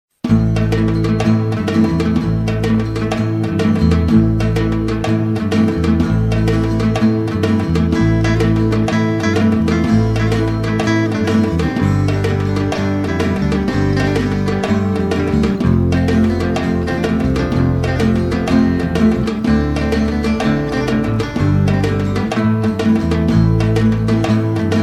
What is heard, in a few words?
Circassian Guitar